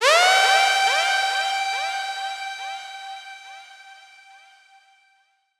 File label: Siren synth One Shot; scratch